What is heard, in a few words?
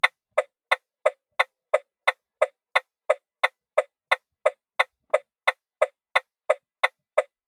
Car Hazard Light INT, Opel Astra H CTDi 1.7; SoundBits